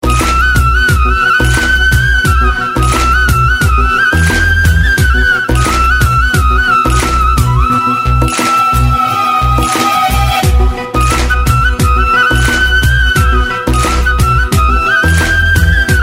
romantic ring

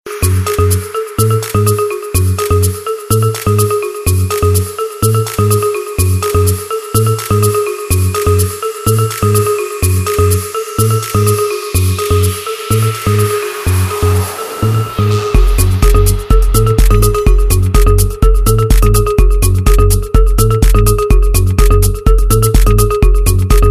minimal music

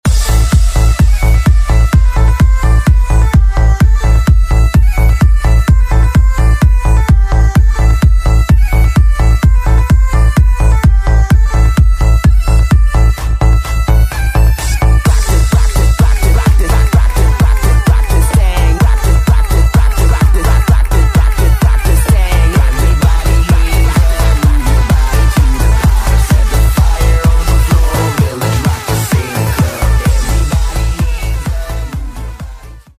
bagpipes; ringtone

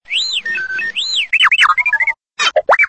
battery low; ringtone; star wars

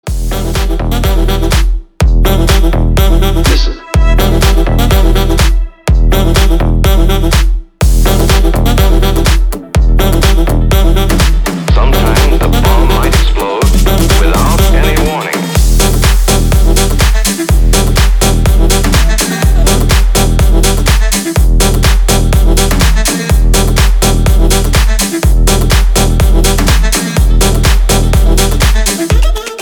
techno

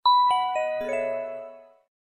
blackberry z10 msg

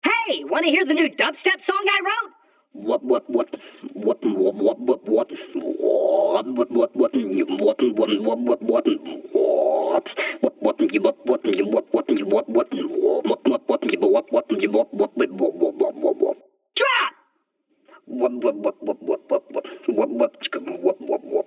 dubstep; ringtone; borderlands 2 claptrap

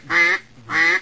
quack

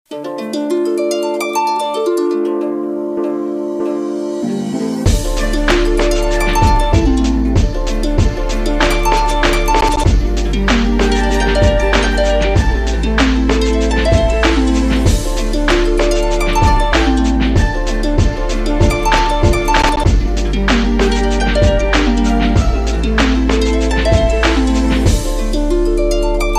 harp mix

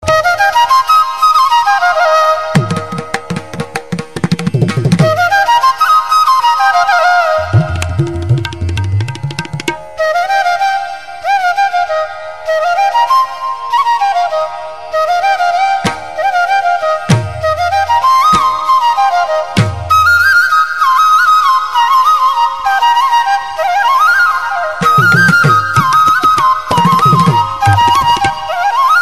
malayalam flute tabla

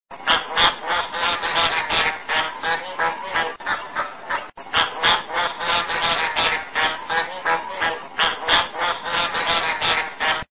duck sms

